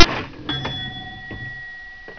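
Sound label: cash register; BELLS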